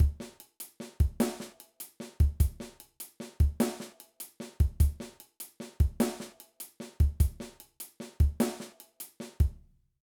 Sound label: Shuffle Groove, drum